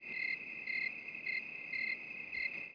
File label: Cricket Amb; SPECOPS